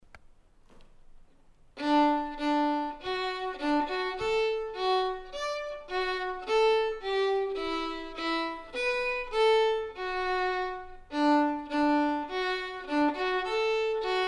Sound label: Galop, simplified version, fiddle